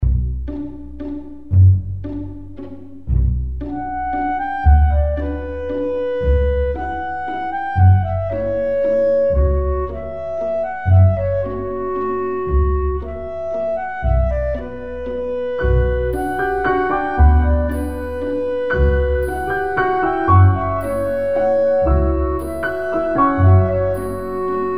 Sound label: Music background pads